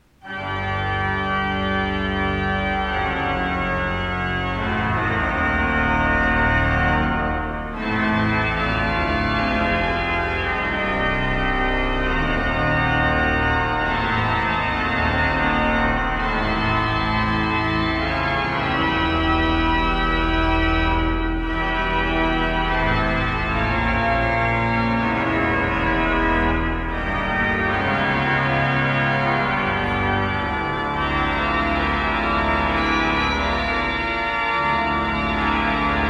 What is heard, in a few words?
improvisation, organ